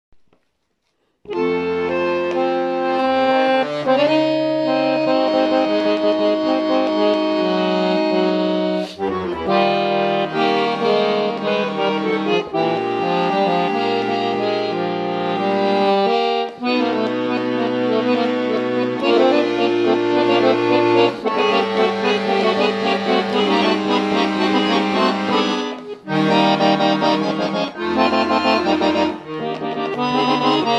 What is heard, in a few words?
ACCORDION SOLO